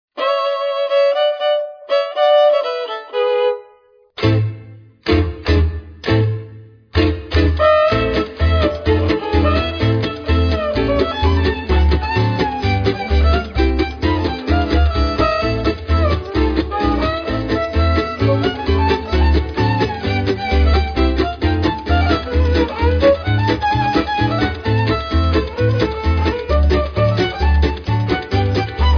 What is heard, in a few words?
New Jazz Fiddle